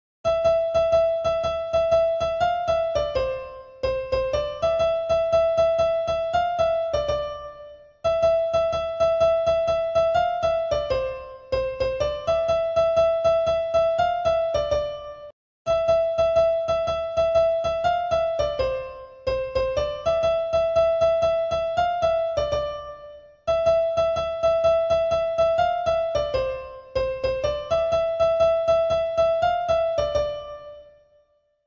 bgm easy piano; tutorial

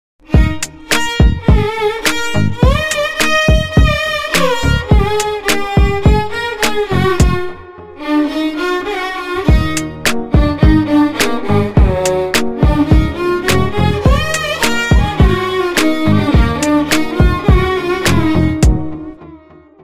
iddarammayilatho

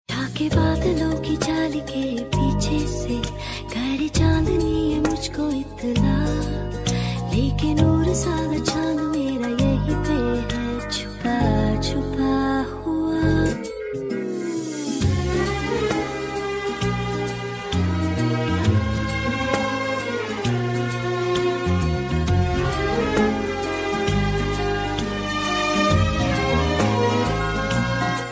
slowed reverb ringtone beats bgm

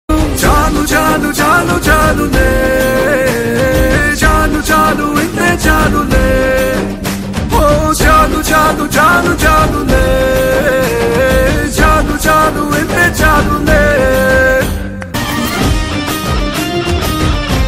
song ringtone music; movie 90ml

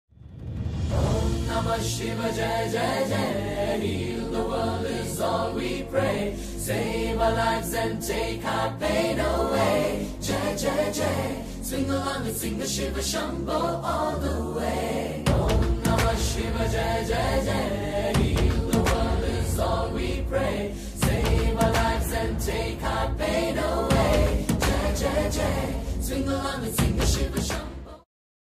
devotional hindi song

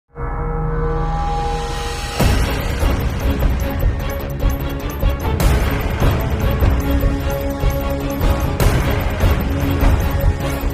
dramatic background music; anupama

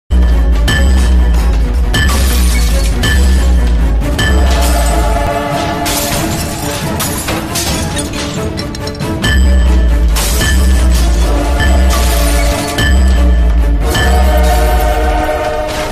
gun hit bgm; demonte colony

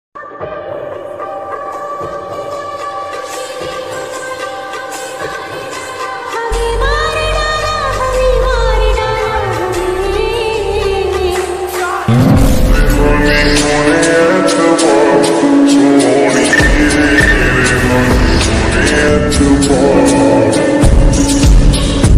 no copyright bgm attitude music bgm ringtones

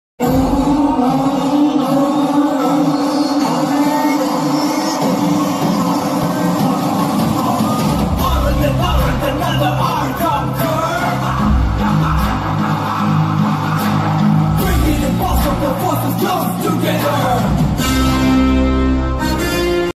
title card bgm; RRR

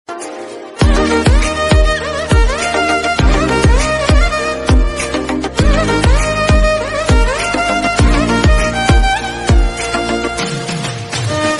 malayalam ringtone english remix ringtone bgm

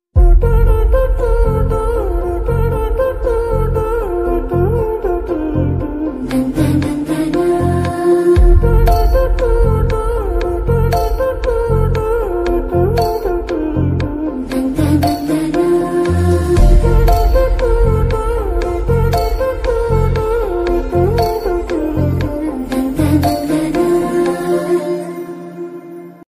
Romantic Ringtone, Love Ringtone; the family star movie

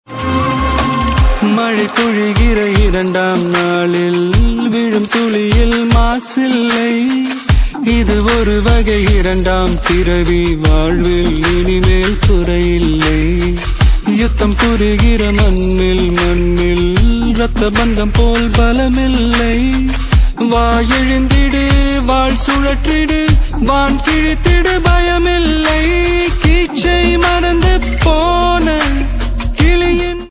song ringtone tamil love ringtone

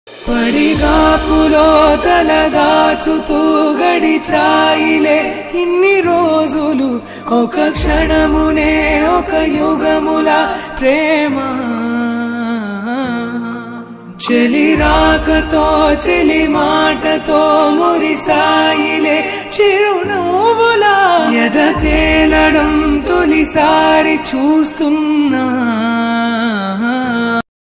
love bgm; dear kavya webseries

